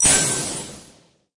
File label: double set